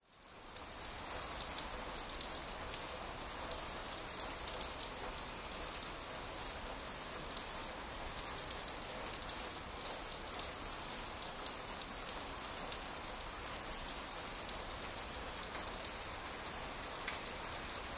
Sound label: rain, Atmosphere Weather